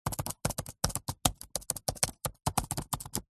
technology computer apple macbook pro keyboard short typing sequence; zapsplat